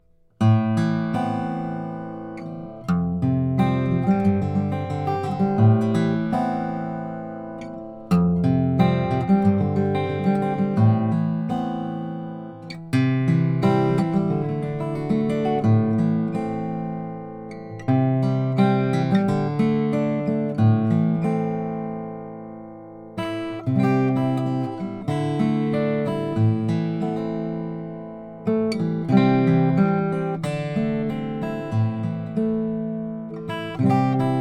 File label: indian hill 00 malaysian blackwood